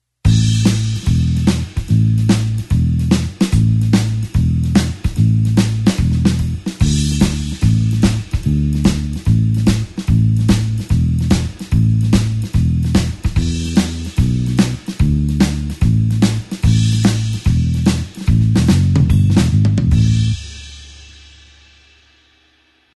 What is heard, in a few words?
blues bassline shuffle A